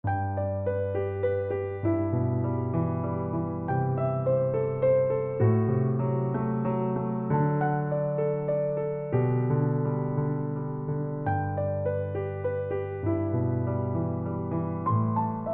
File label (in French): Petite tude